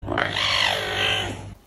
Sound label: Caracal; سیاهگوش